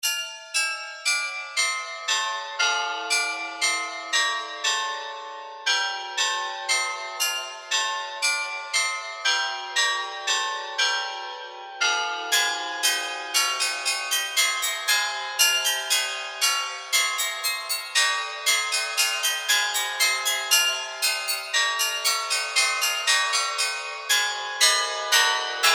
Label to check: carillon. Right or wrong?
Right